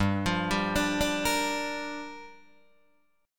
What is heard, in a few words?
G Minor 7th, Guitar, DADGAD